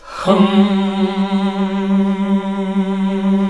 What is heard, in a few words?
HMM G1, CLASSIC VOX8